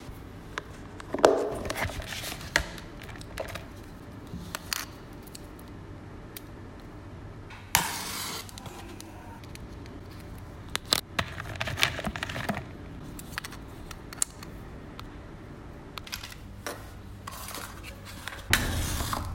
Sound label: Box of candles